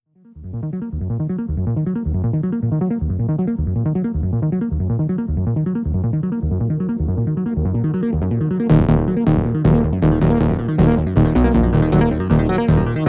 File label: Rocket Level; Minora-Game; Sound-Artlist